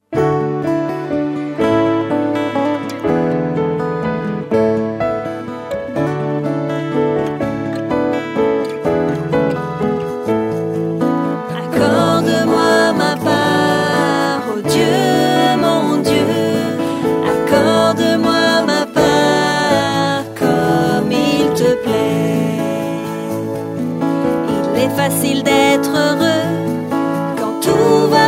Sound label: Song, FR